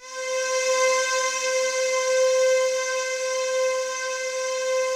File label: DEEP PAD C4 R, Yamaha VP-1; DEEP-PAD 3; Music Store